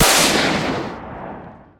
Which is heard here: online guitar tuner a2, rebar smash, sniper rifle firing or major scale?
sniper rifle firing